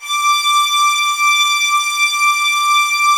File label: D6, soft violins; Q Up Arts; Denny Jaeger Master Violin Library